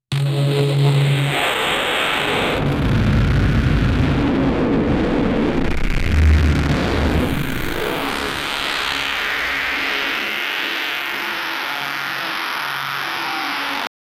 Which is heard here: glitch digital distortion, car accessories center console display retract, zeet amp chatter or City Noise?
glitch digital distortion